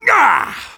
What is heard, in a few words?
taunt exert; Sniper